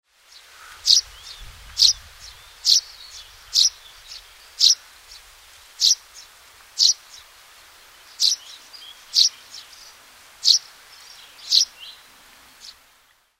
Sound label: tree sparrow